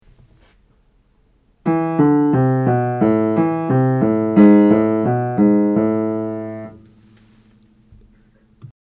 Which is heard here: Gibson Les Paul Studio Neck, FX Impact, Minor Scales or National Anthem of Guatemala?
Minor Scales